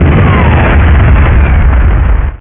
nitro

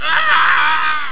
AARGH

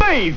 BASS

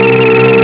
Space Ship Engine

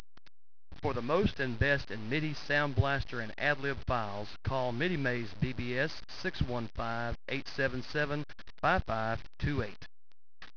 MIDI

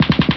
machine gun

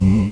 alien

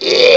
die; zombieii

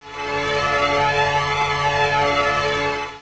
Teleport

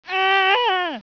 aargh

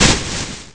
SPLASH

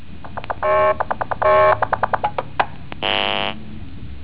Computer Working

